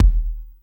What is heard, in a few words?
Kicks Electro